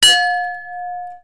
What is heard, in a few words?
Bell